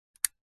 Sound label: staple gun metal mechanism latch click; office